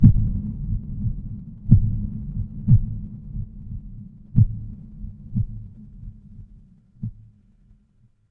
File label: horror heartbeat